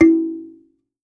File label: kalimba; Voice Crystal - Steve Reid Definitive Percussion